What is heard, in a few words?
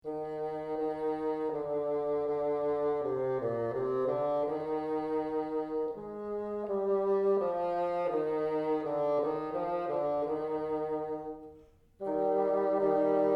together, bassoon; Ab2 uses the same fingering as Ab1, except that your first finger in the left hand will be